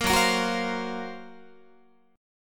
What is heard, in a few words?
F sharp Major 7th Flat 5th, down, Guitar; F#M7b5 Chord; strummed; 13,14,13,16,16,16